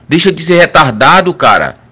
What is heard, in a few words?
berimbau